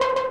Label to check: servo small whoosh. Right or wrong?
Wrong